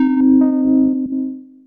out of control pitch wheeled; instrumente

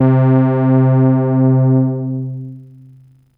Fat Moog C

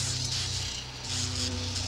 Thriller

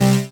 dance chord

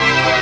dance chord

